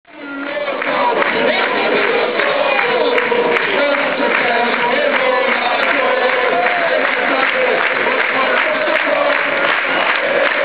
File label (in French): Tous ensemble allez les bleus allez les blancs